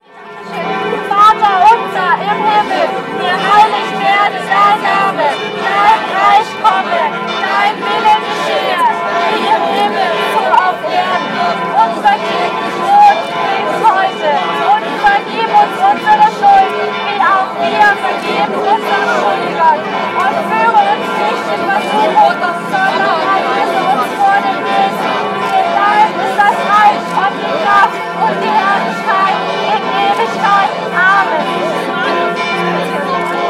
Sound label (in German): glocken vater unser, kirche; profanierung kirche manheim